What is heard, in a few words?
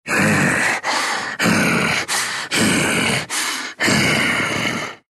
breathing, ru